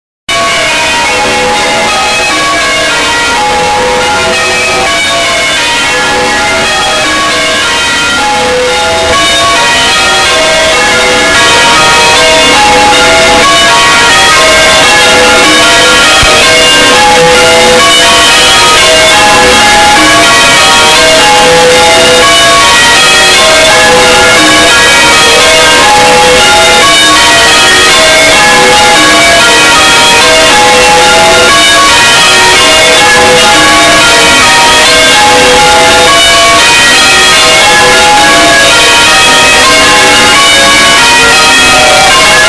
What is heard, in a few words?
church bells; brize norton